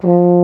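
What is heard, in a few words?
baritone F2 horn; InVision Interactive; Lightware VOL-1; Instruments & Percussions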